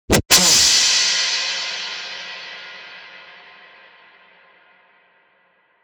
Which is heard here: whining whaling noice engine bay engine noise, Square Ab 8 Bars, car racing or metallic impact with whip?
metallic impact with whip